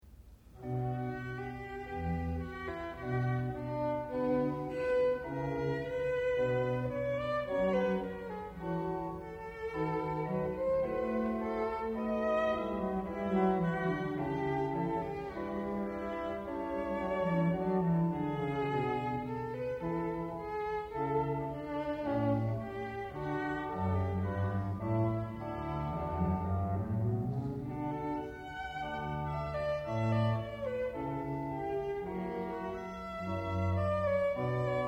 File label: Bach Solo